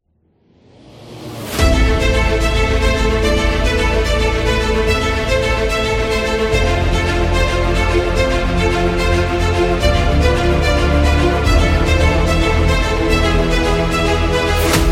Cinematic Epic Dramatic; self motivation

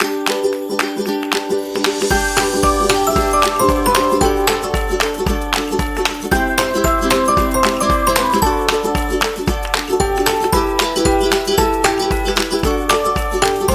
Christmas Upbeat Folk Background; xmas

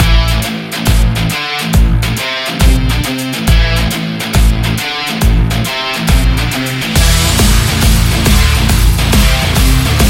Champion Rock

